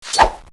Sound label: Lizard Warrior Attack Sound